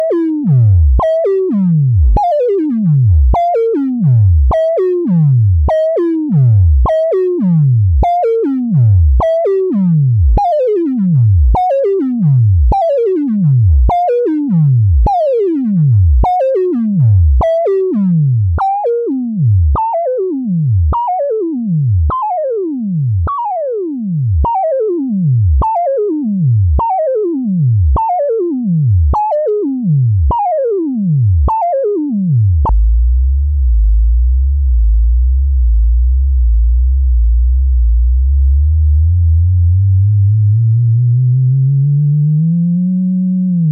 sprott oscillates itself; synth

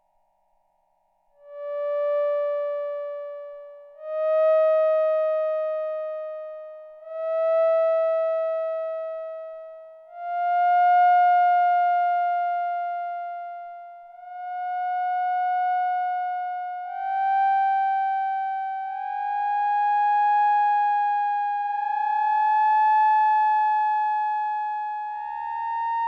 channel manual dry, synth

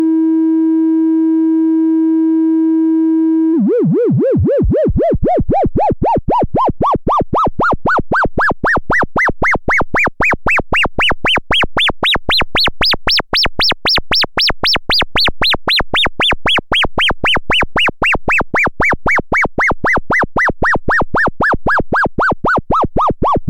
cali osc linear fm, synth

